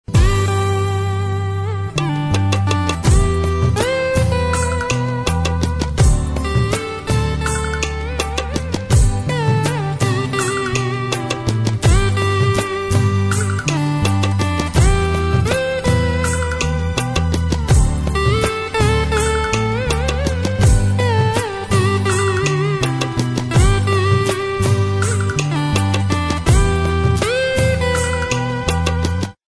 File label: Hindi Instrumental